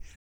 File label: DEEP BREATH, Vocal Planet Blues & Soul; ILIO